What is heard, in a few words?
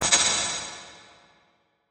Hihat; Ghosthack Advent Calendar Day 4